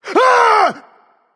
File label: nv fear, vox; smite; voicepack; vulcan